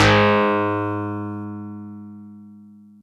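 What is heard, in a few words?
Easy Clav DX100 G#1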